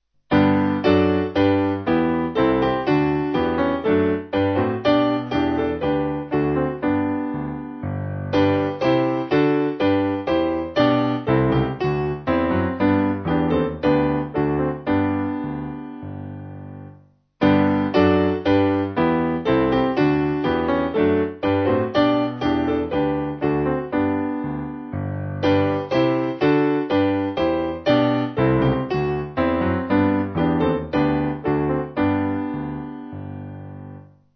With Joy We Meditate, Piano; Simple Piano; St Stephen

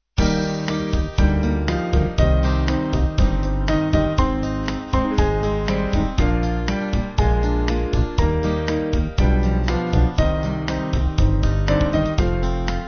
Praise You Lord, Band; Rose

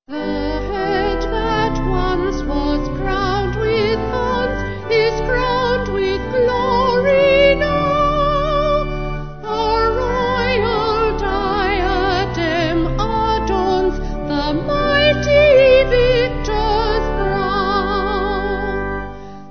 Vocal Organ

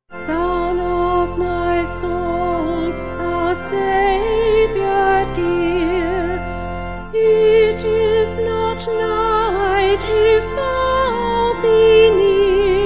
Vocal Organ

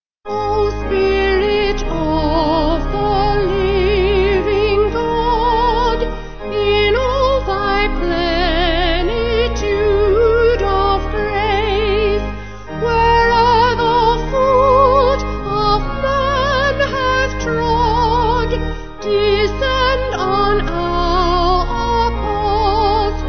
Vocal Organ

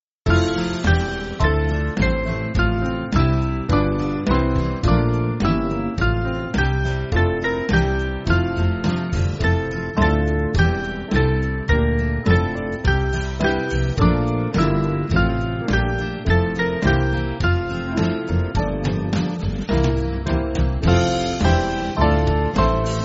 Band, church music

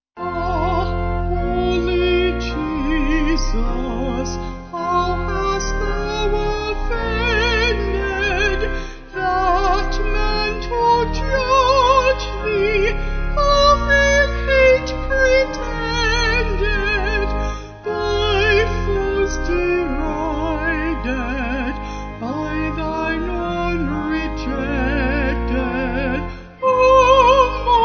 Vocals Organ

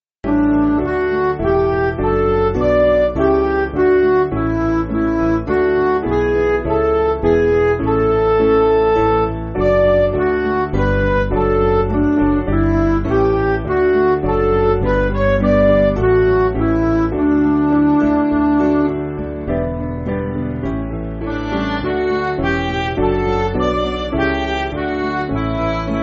Midi

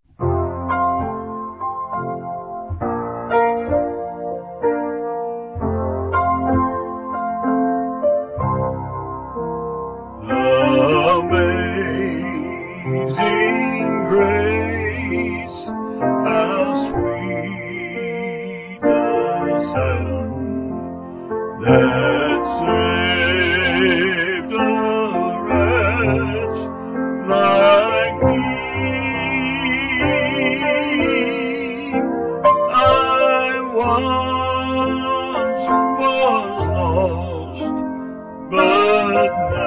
Amazing Grace Vocals Piano

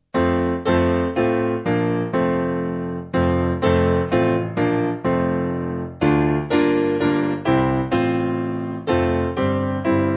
O Worship The King, Piano; Houghton

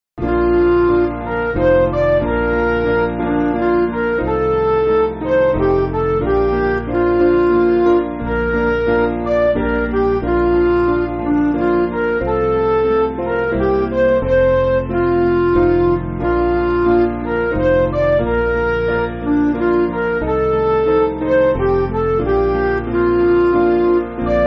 Midi; Think Over O Sinner; Stebbin

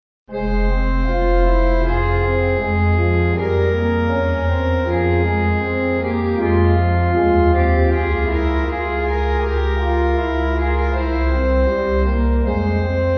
Choral Prelude Pipe; Bach; BWV639

